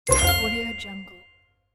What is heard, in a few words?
small success; game